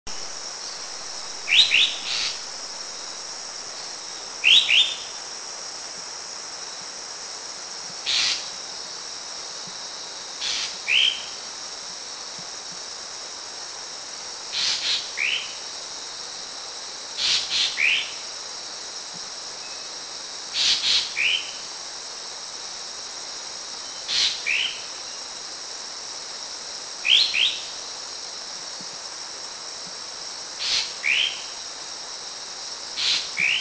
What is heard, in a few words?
Balicassiao; Philippines